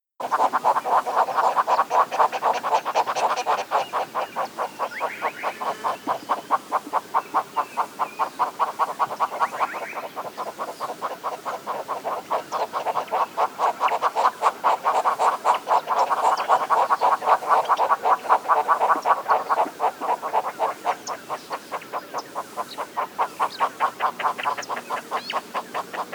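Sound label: Tockus deckeni -- Von der Deckens Hornbill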